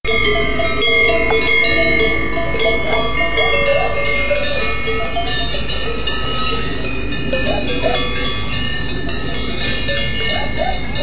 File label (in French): Alpages, Nature